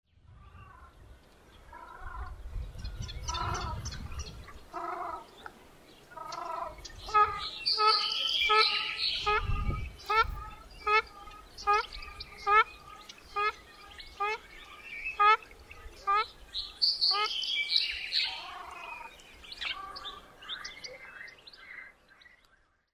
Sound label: ruddy shelduck